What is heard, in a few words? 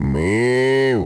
Moo; lego my style preschool